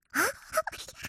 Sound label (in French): EXCITATION; mirumiru